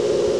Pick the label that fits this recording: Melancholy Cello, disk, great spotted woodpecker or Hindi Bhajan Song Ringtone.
disk